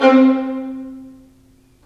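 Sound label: violins marcato, String Sections; Roland LCDP13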